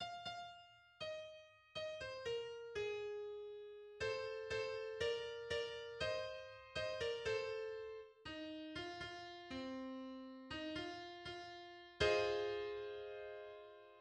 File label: vocal melody with response